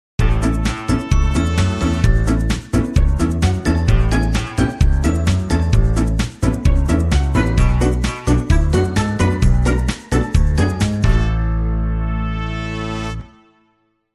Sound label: Cheeky Chimes